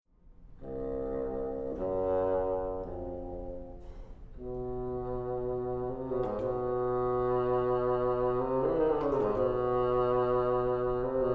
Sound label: Bassoon Sonata